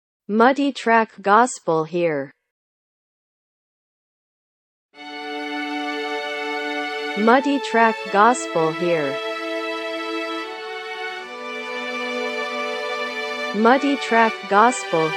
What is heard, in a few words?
STRING